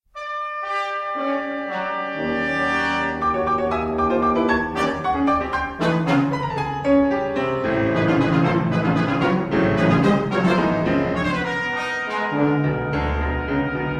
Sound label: Piano and Brass Quintet, Allegro con Brio; Concertino for Piano and Brass Quintet